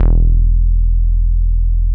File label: MOOG1 BASS E1